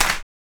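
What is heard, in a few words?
clap; E-Lab; X Static Goldmine 1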